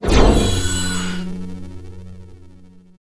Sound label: mining attack